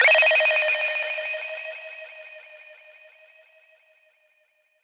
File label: Circus Synth FX; trap; Cymatics; Trap Starter Pack